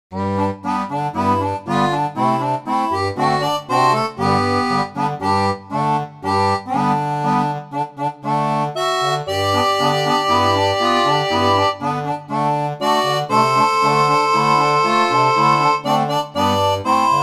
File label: tango, seraphone